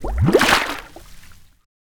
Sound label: liquids bubbling